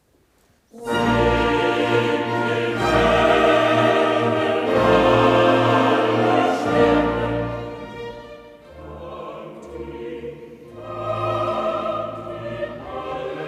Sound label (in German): Singt Dem Herren Alle Stimmen